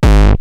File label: Roland TR-909 BD; BD Selection